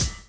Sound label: DRUM CHOP; AMG Gota Yashiki Groove Activator